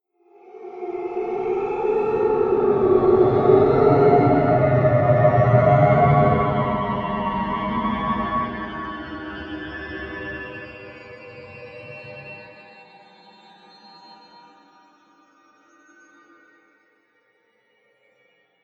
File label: Ghost, horror sound effect